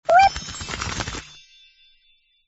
colorbomb flowers; gardenbloom

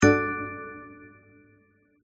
Combo; gardenbloom

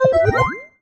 win; zoom

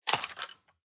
open box; chicken

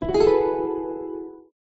Combo; gardenbloom

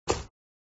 panel destroy; gardenbloom

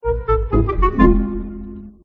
level lose; gardenbloom

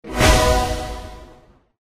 lightning; jewels

